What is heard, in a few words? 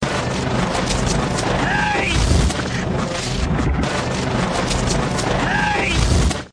super spooky panning ship